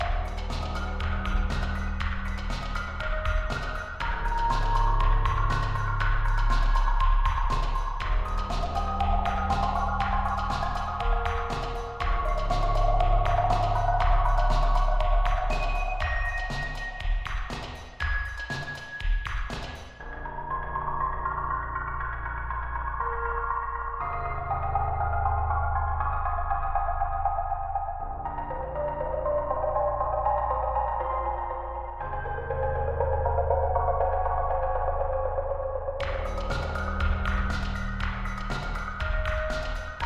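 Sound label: Music Thirsty Tavern; Griftlands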